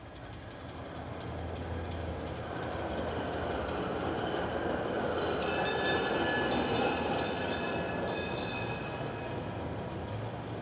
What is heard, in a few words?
tram; Mafie 1